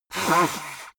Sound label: nose blow big; soundpool